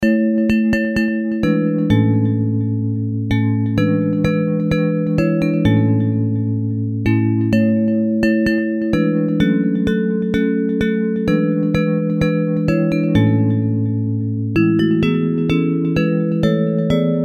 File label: Bells